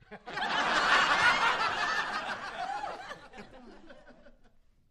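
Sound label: Crowd 50 Single Hearty, small interior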